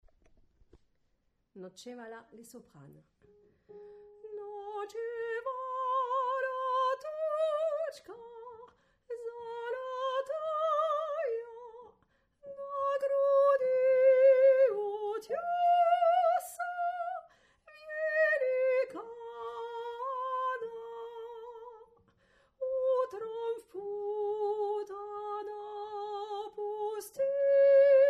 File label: Soprano; Ночевала тучка золотая